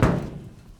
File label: Submarine Step